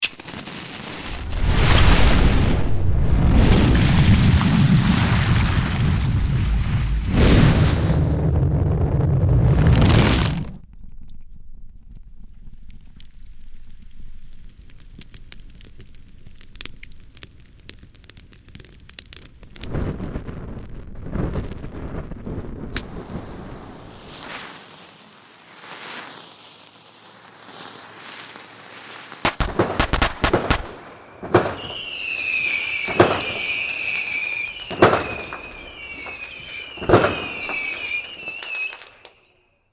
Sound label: Fire; Big Room Sound